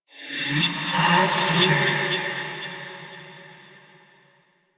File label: Hand Compass Vocal; Warframe; Duviri